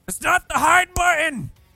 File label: hide button; skullstream